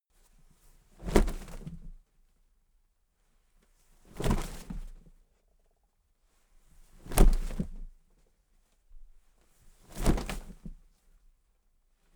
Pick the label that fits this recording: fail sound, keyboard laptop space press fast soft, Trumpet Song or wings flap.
wings flap